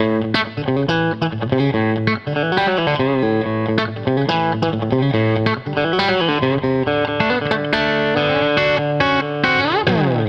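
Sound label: Country Guitar, Verse 1+2; Digidesign; Pro Tools Essential Loops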